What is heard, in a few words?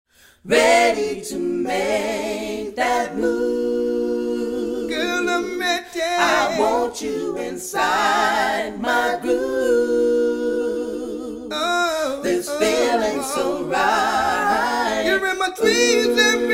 Vocal Group Room